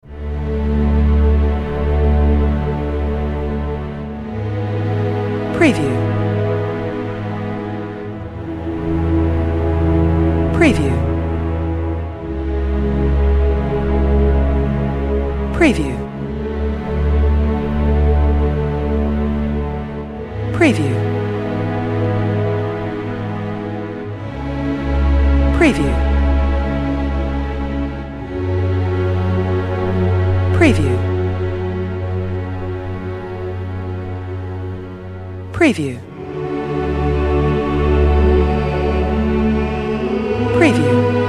Emotional Fantasy Storytelling Background Sad Female Voice